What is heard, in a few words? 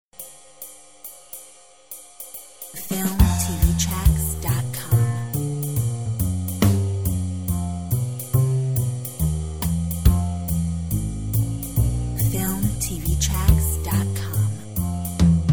Drum bass; Filmtv-tracks